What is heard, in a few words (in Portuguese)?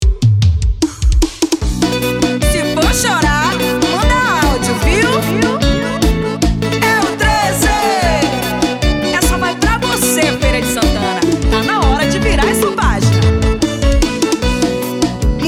Chorinho da Panela Piseiro